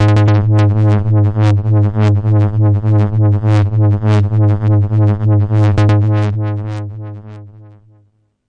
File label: overloaded G sharp 2, unstable synth bass